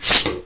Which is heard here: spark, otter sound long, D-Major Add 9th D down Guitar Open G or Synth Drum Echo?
spark